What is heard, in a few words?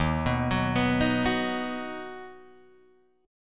Eb Minor Sharp 5th Guitar Open G